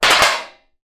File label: tin lid down on floor; foley; zapsplat